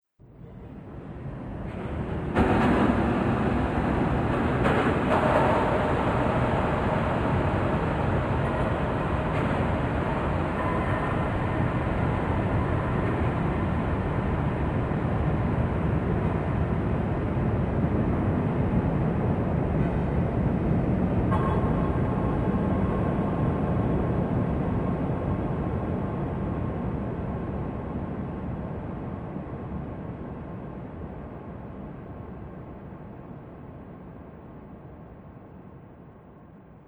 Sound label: Horror Scene Ominous Dark Rumbles; Scary Soundscape Sound Effects